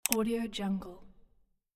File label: mouse click; audioheart